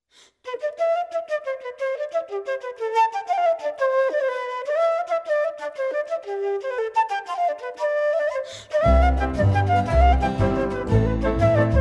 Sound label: jazz band; Nippersink Rogues